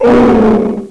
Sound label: death; HALFE LIFE 3